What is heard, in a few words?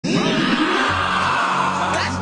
naahh